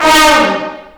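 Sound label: FALL, brass; AKAI S-Series CD-ROM Sound Library VOL-2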